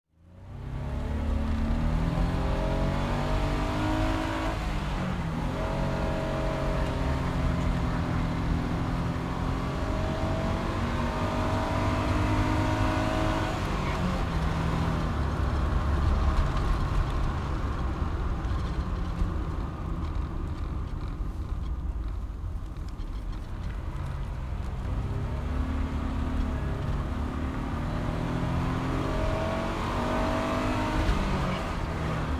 Fast Car (interior)

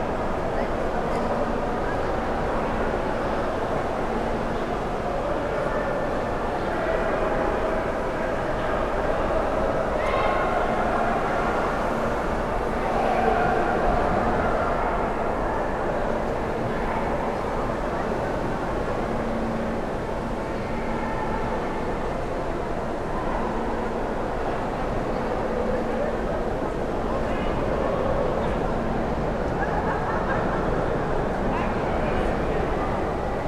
Urban people babble crowded reverberating highly; urban and suburban; boom library

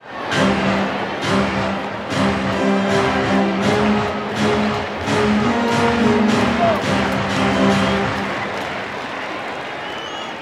Baseball Organ Crowd Claps; SFX Kit; Crowds

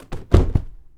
Bodyfall Padded Table; SFX Kit; Bodyfalls

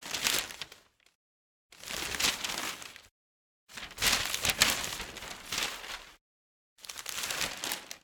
Organic Paper Page Turn; boom library; The Interface

